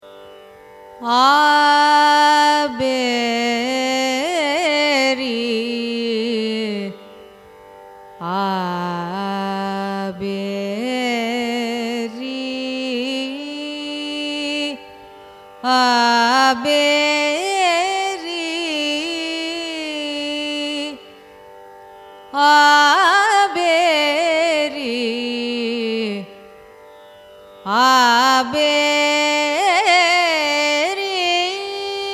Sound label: raga abheri signature; Arohanam: S G2 M1 P N2 S; carnatic music